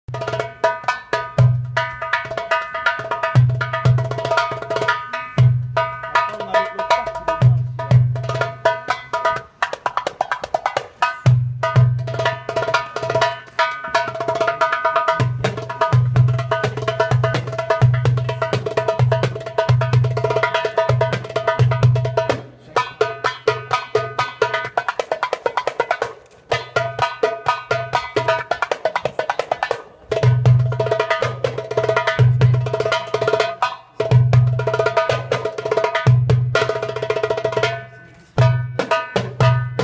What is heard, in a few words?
Darbuka